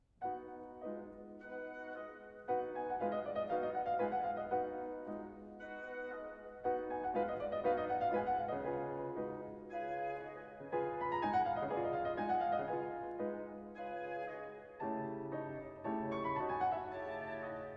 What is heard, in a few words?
Concerto for Piano and Orchestra; Mussorgsky; Pictures at an Exhibition; L Leonard; Geoffrey Simon